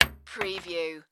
Bullet Shell Drop Machine Gun Hollow Wood

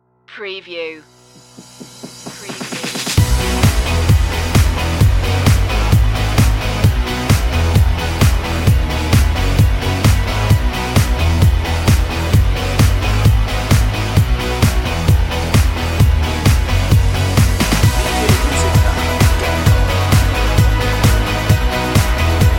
neon guitars underscore

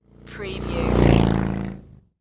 Motorcycle Harley Davidson Drive By Center

